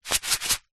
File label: food small packet of cous cous shake; zapsplat